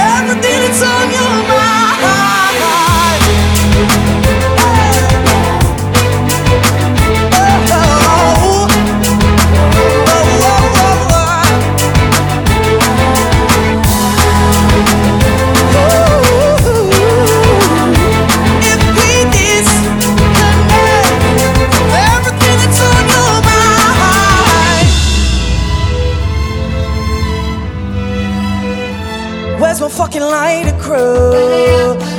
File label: Orchestral Acoustic